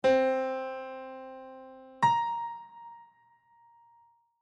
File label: music intervals minor fourteenth